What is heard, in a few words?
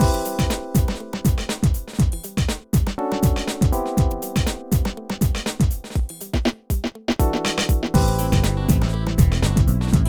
Hip-Hop Stage Karaoke; Pinky Street Kira Kira Music Hour Pop Princess; Hip-Hop Stage 5